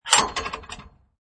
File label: Metal Gate, Metal gate fence lock opens; Metal Doors